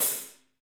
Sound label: HH HH